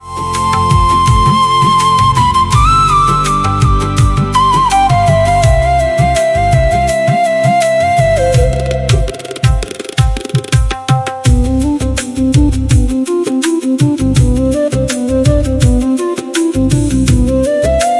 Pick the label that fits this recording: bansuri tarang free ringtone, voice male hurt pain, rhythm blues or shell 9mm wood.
bansuri tarang free ringtone